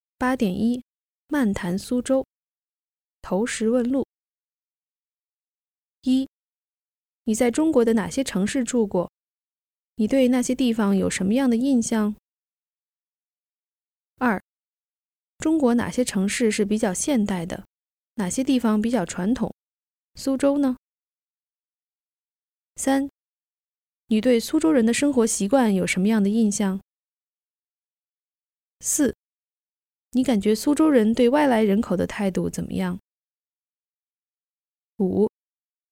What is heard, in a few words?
Warm up